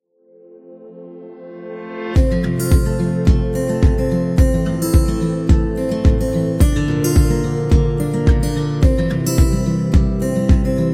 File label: Acoustic Upbeat Indie; happy music vol 7